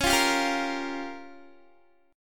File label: Db 6th Flat 5th Db down Guitar; Db6b5; strummed